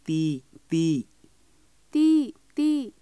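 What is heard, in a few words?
partridge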